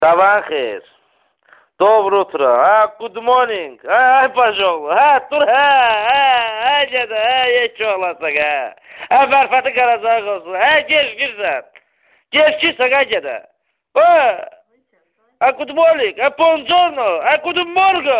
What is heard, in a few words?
Azeri